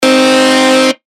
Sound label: Synth Shot C; synth shots tech house; Velvet; revealed